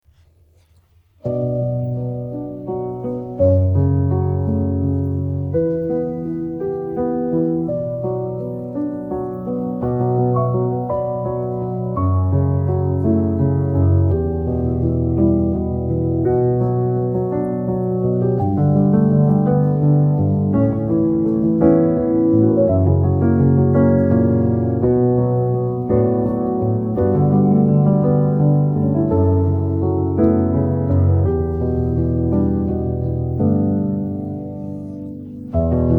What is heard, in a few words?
Piano Version